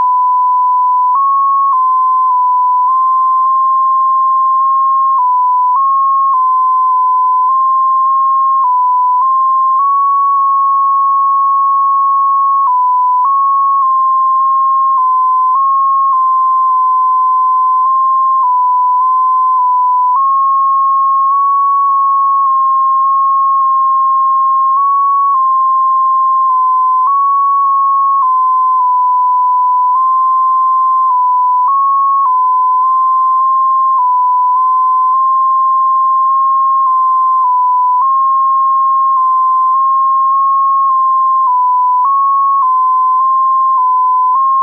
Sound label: JT9D; from WSJT-X with default 1500Hz tone setting